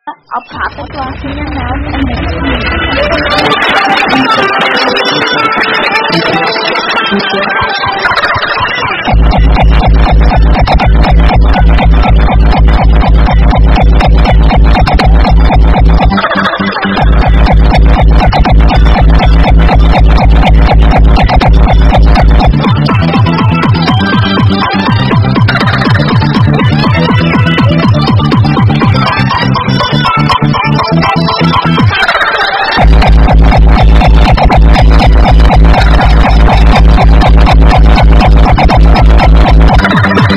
destroyed spines loud

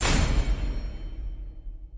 horror sting

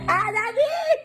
participation button

